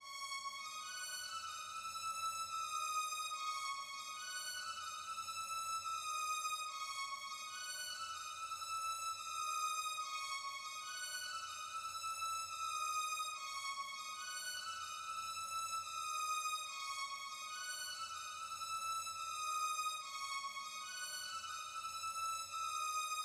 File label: 144 BPM C# Min Violin; Loop Stems; Cymatics; Warning; 2022 Melody Collection; Drill